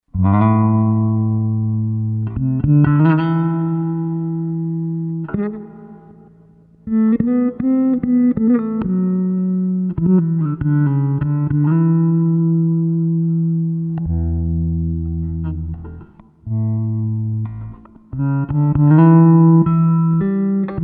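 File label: FRETLESS, Zoom 506 II bass effects factory preset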